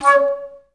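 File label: staccato C#4; Best Service; Peter Siedlaczek's Advanced Orchestra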